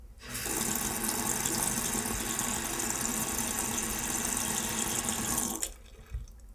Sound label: Running Water